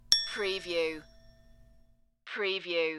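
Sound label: Xylophone 1 Note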